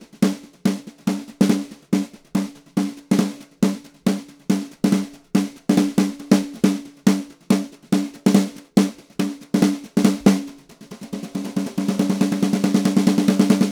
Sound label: Country Drums Intro; Digidesign; Pro Tools Essential Loops